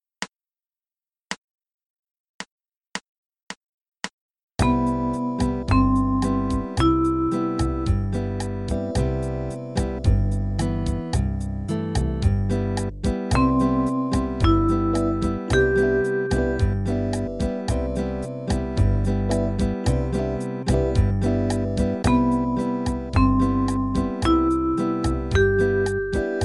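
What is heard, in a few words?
Maj7 Third Inversion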